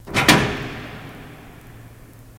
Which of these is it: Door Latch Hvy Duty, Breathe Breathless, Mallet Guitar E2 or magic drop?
Door Latch Hvy Duty